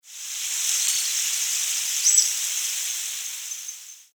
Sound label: Harpagus diodon single call